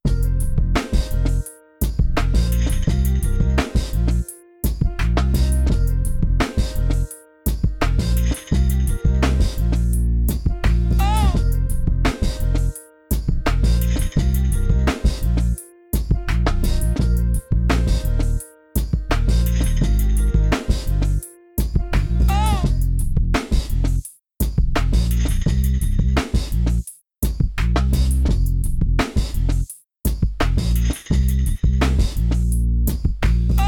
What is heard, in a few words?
beat